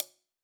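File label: Sab Closed Hat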